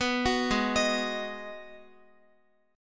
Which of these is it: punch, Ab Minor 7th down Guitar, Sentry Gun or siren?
Ab Minor 7th down Guitar